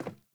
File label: Sandals Run Wood, Footsteps